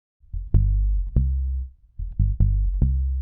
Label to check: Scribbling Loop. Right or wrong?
Wrong